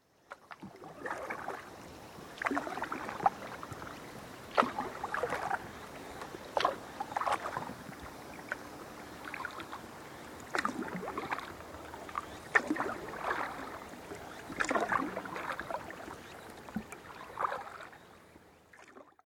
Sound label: on kayak